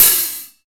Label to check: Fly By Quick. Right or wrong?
Wrong